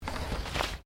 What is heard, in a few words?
Umbrella Close